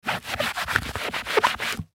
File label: Eraser Dry Clean White Board Fast; Office; Blastwave